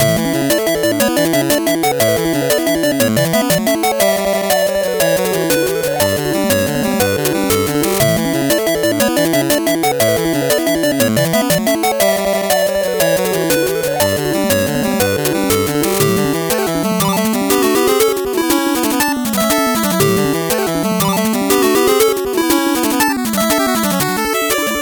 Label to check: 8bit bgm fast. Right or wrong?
Right